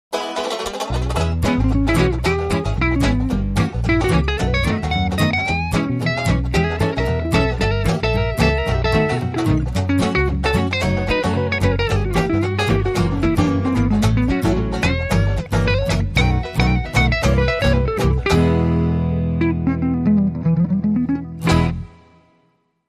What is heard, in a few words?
Guitar Underscore